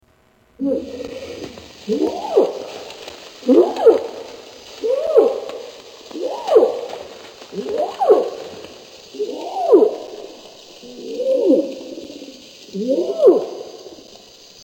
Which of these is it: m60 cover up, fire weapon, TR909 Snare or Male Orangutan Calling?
Male Orangutan Calling